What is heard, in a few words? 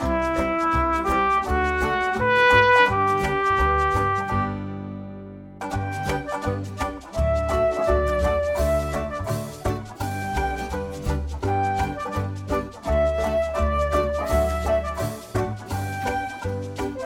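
instrumental version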